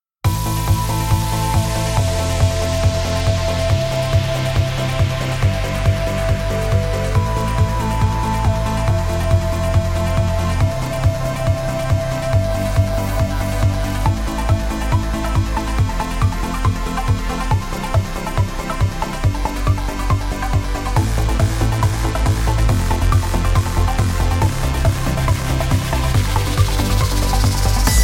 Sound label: Trance